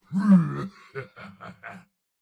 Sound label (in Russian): Смех; Турбосвин